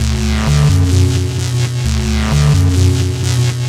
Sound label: Noisy Gate, Synths; Sonic Foundry (Sony Creative Software); Modular Electronica